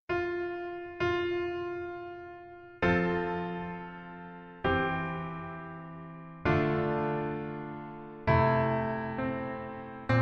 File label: Piano ver